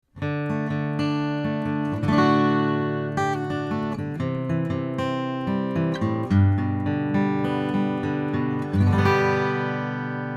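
Austrian Audio OC18 Acoustic Guitar Pick 20cm 15 Fret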